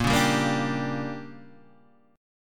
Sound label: D Major 7th Suspended 4th Sharp 5th